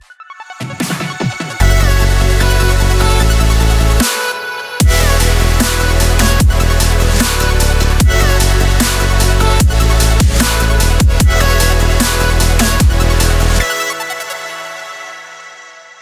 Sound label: powerful intense dubstep